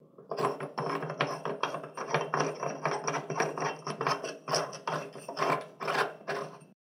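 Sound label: screwing a nut; krutyat gayku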